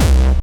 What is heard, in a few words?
Bassdrums Hard Kicks; Vengeance Samples